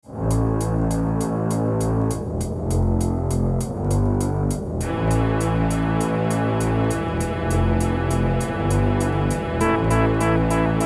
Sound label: AWE32; Raptor